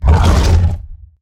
Stealth Attk; Evolve; Behemoth

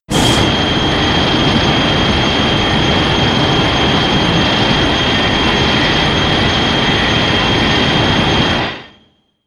Heat Ray; Shin Godzilla

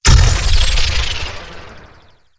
Teleport; Evolve; Wraith

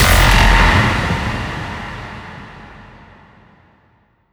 fire; Strider Remake

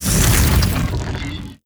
strider leg stretch; Strider Remake

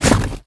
Tongue Grab Pull Back; Evolve; Behemoth

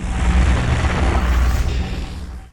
Banshee Mine Arming; Evolve; Kraken

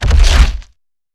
Climb Base; Evolve; Behemoth

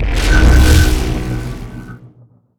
Grounder Glide Start, Kraken; Evolve

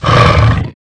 pain, Necromancy

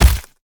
Collisions Slow; Lurker; Evolve; Gorgon

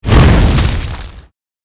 Rock Throw FLAME; Evolve; Meteor Goliath

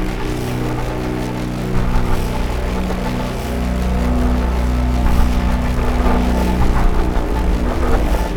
Glide Loop; Grounder; Evolve; Kraken

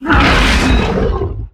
Exertion Heavy; Evolve; Behemoth

